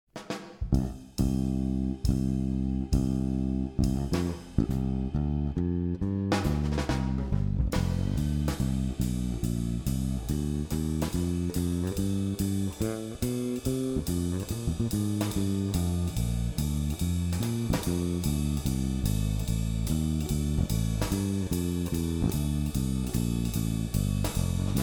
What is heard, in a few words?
Jazz F Blues Improv Etude for Guitar & Piano